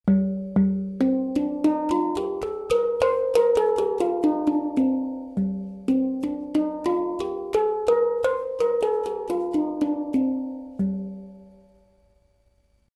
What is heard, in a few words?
hang